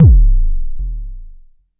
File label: Jomox MBase, drum machine; Jomox MBase 01